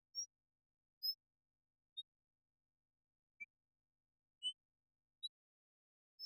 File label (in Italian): uccello; Francesco Coen